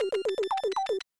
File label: Unused Jingle; Super Double Yakuman 2; SNES